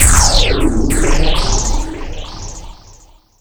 Beam

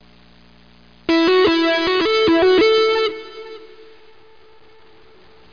elec guitar; Broderbund Print Shop Multimedia Organizer Deluxe Edition

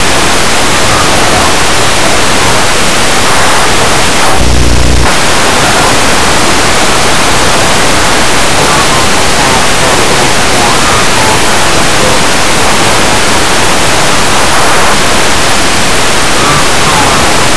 radio

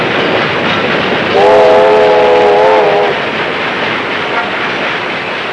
trn wh, transportation

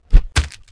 bod strk, bugs; Starship Troopers

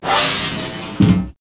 slider

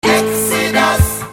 stop; Desktop Studio 2000; Music

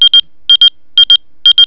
Pager

